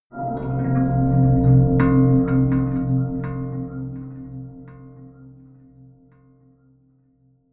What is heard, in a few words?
melodic odd pad bell low; flaechen